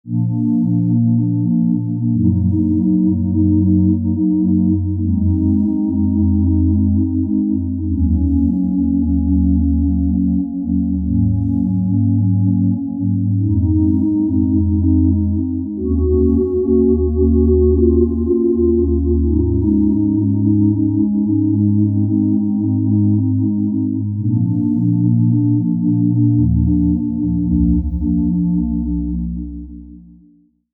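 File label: DSS Sinusoid Delay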